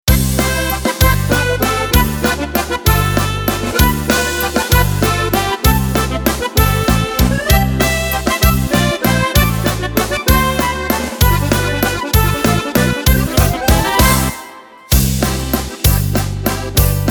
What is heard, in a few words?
Folk Waltz